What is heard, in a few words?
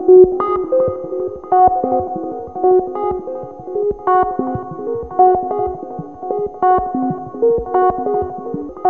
WHOLE TONE, WEIRD SPACE; Zero-G Ambient 1